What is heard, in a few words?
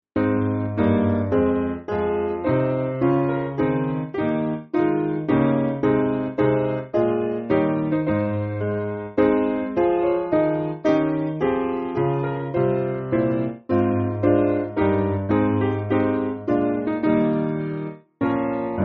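Piano, Music Backing Files for Churches; Lead Us Heavenly; Feniton Court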